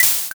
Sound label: syringe